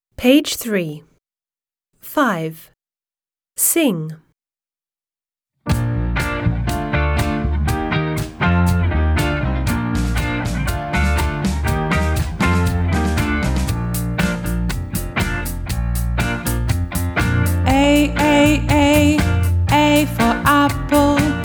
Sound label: alphabet song A to C, British; My alphabet book; Smart Book 1